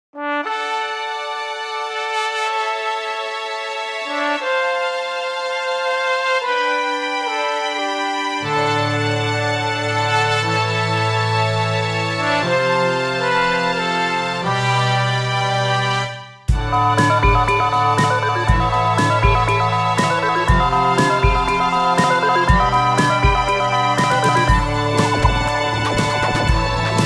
Logic ProX Song Strings Horns Brush

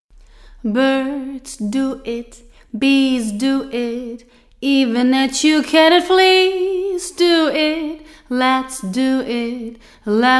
Plate

